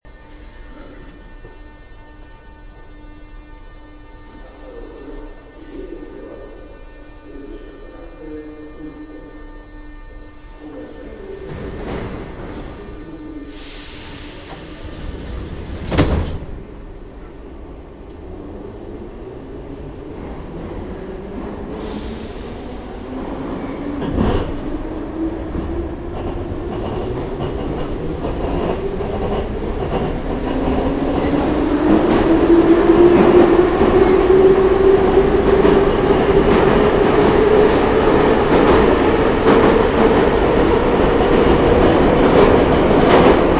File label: Moscow subway sound